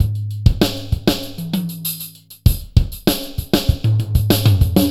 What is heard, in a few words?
Drums; Sonic Foundry (Sony Creative Software) - Stylus Pressure Urban Grooves on Digital Wax